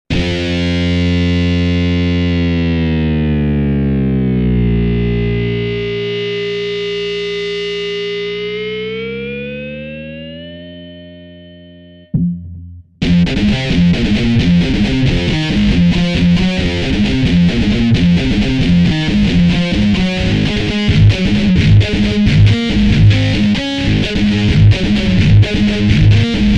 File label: YAMAHA S90 Metal Mute